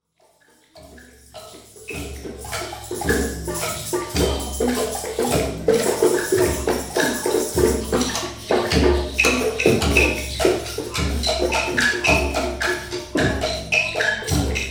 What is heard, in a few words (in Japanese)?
shougakkou de no doramu saakuru; 小学校でのドラムサークル; Chino Cultural Complex; 茅野市民館